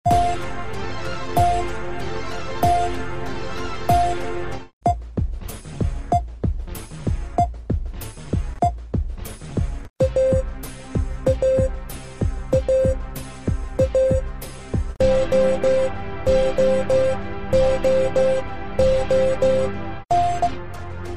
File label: Morse Song Sequence 1-4